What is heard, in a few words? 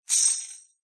foley keys large bunch prison guard throw jingle